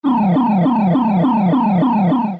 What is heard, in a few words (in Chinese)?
盗警; 告警; 广播室铃声系统